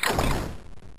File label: bullet generic titan shield scatter; Titanfall 2